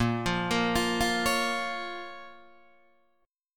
Bb Minor Bb down Guitar Open D

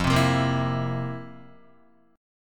F Major 7th Suspended 4th F, Guitar; FM7sus4 strummed; JGuitar

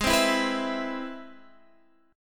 C Augmented Major 7th Ab

